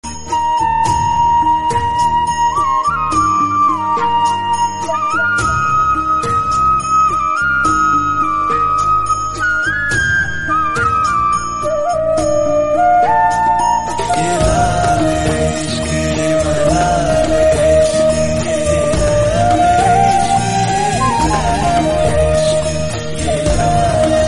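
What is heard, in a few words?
background music ringtone; Ram Leela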